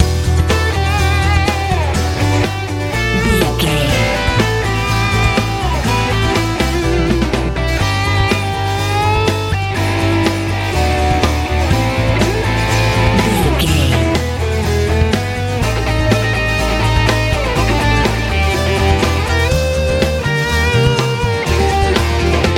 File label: easy rock